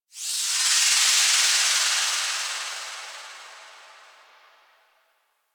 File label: Energy Thread Curve One Off; Warframe; Apostasy